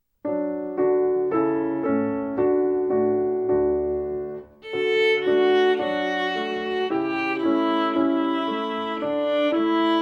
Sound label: lightly row violin